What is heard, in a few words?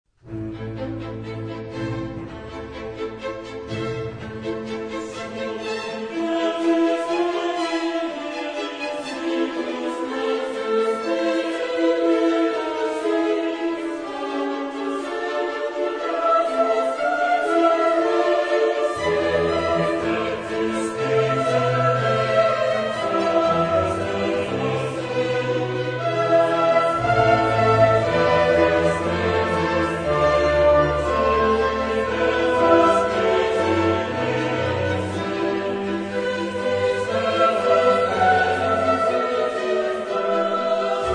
chorus; Mendelssohn; Lauda Sion Op73; Sub diversis speciebus signis tantum